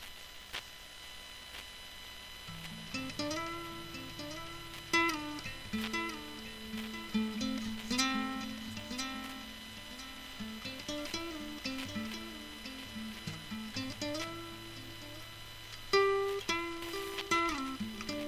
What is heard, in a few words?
blues test